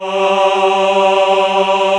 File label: MALE G2, CHO AH